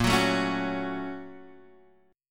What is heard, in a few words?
Bb Minor Major 7th Sharp 5th, Guitar, Standard; BbmM7#5; strummed; x,1,4,2,2,2; JGuitar